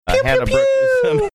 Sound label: making ray gun like air horn sounds